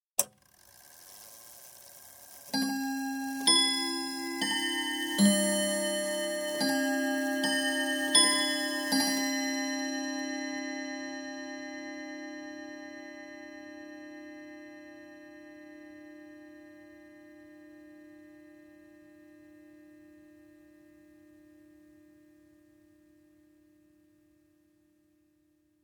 Domestic chiming clock striking half past the hour; Chime Sound Effects; BBC